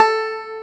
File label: BANJO A4, ETHNIC; AKAI S-Series CD-ROM Sound Library VOL-8